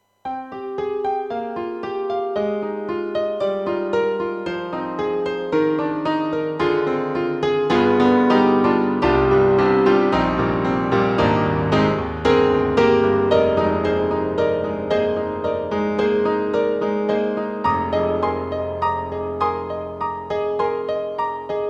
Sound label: YAMAHA MU500 Dbl Con Gr